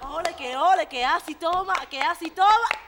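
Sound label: rhythmic vocal; Best Service; World Colours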